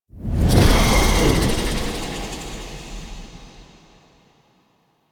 Tease Spawn; Stalker Assassin; Warframe; Warframes

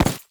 Step Default, Foley, Footsteps; Enemies; Warframe; Lasrian Soldiers; Lasrian Butcher

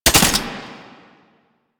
Belt Fed Rifle Mech; Weapons; Warframe; Tenno; Frumentarius

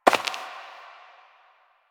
Step Dirt; Warframe; Koumei

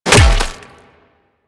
Melee Hammer Impact Flesh; Warframe; Weapons; Hammers

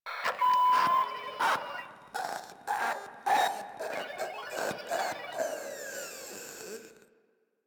Proto Infested Runner Idle; Warframe

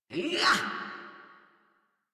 Attack Effort, Battle; en; Warframe; Grineer; Catbrow Handler

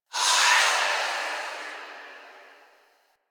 Fadeout Stinger; Enemies; Warframe; Entrati Doppelganger

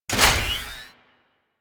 Crp Ship Fighter Platform Start B; Ambience; Props; Warframe; Corpus Ship Remaster

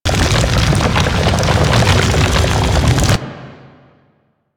Barrier Move Loop; Warframe; Brawler

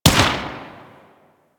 Bladed Pistol Shot; Warframe; Tenno

